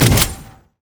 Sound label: spray and pray; punk twitch